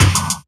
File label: Spitter Kick, Esoterik Beatz; Spitter Kit